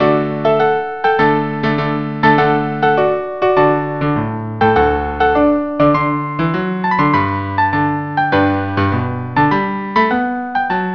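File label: violin cello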